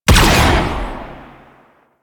Blastor Pistol Shot; Warframe; Weapons; Corpus

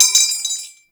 Bayonet Clip Drop; Warframe; Tenno

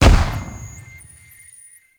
Explosion; Mischief Rework; Warframe; Mods; Pet Mods

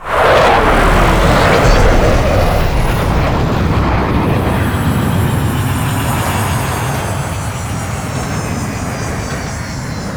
Grineer Ship Liset Level Intro Docking; Vehicles; Warframe; Liset Flight

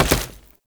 Step Flesh, Foley; Warframe; Oraxia

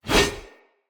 Whoosh, Swords; Weapons; Mockery; Mockery Brazard

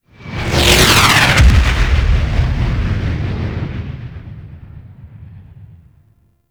Ship Fly By Slow; Vehicles; Warframe; Liset; Liset Flight; Grineer Ship Liset

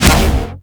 roulette jam burst; Cowgirl

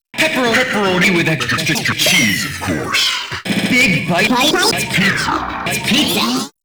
Glitched Loop; Big Bytes; Warframe; Ambience; NineteenNinetyNine

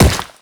Step Rock, Footsteps; Characters; Warframe; The New War; Kahl

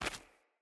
Run Stone, Footsteps; Characters; Mother

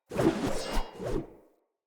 Player Sphere Polearm Spin Whoosh Fill; Weapons

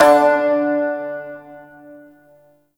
Shawzin Oct One D; Warframe

